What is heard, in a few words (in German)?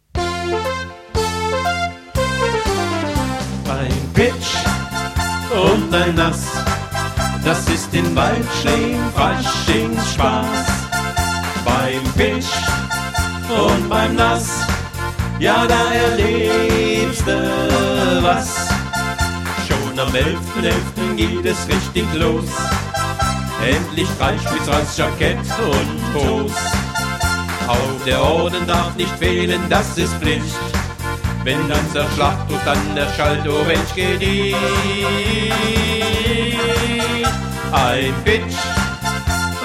Schlager